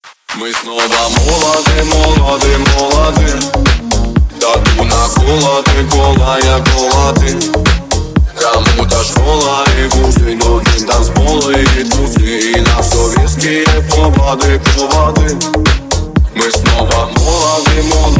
remix

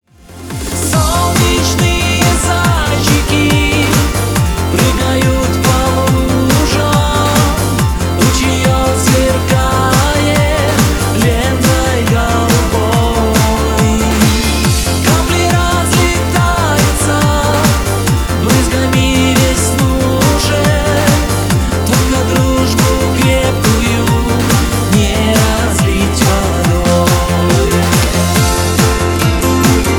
remix; masha i medved

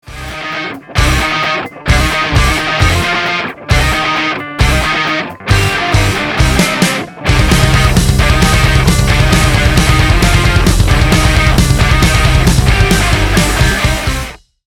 cover instrumental

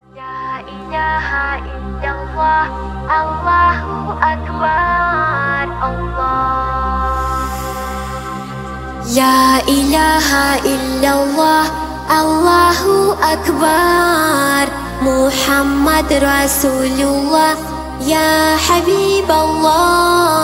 allahu akbar

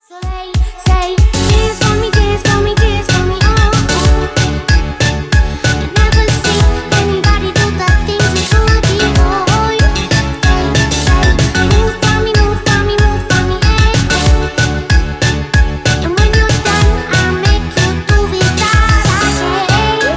remix

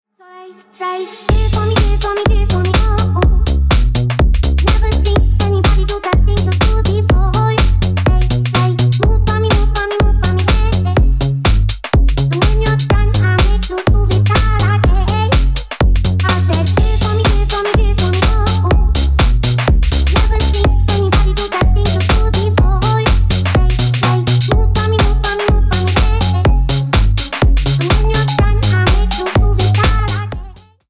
remix

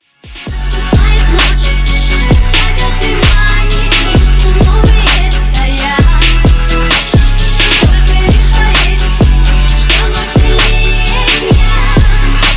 phonk

